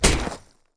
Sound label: yeti vs stone; nunu